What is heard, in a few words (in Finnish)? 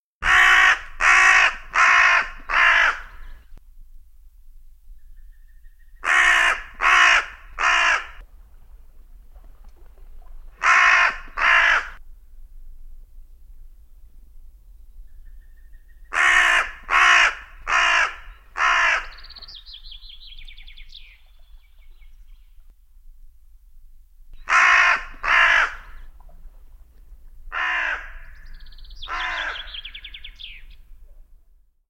talvi varis; Luontovuosi